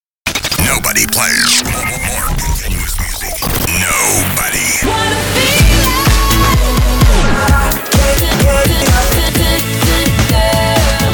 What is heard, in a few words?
beatmix; dancemixusa